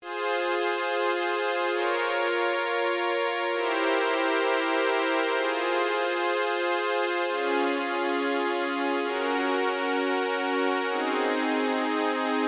ob-xa pads

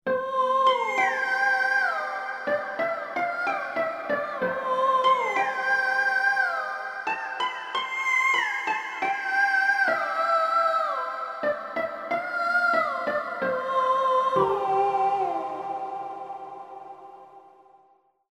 d-50 ocean scenario

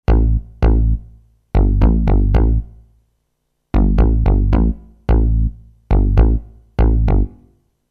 d-50; Octave Synth Bass; Octave Synth Bass (demo by Per Kristian)

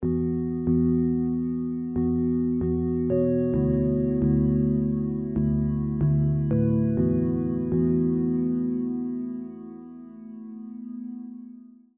xp80 vintage card analog chime

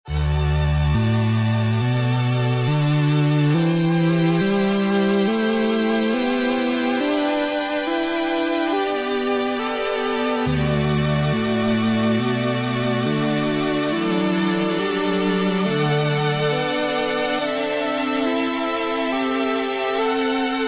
rmi kc strings; excerpt

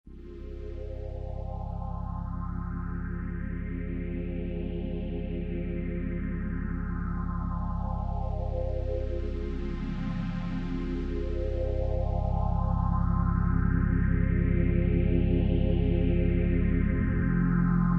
e310u; Eminent through Small Stone

